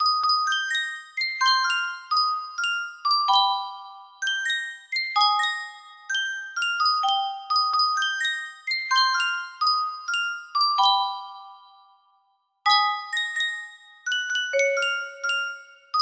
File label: yunsheng music box, melody